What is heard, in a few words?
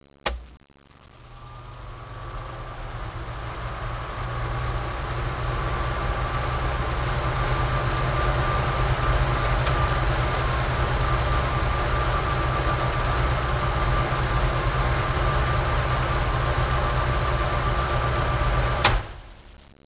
FAN; ambient; energy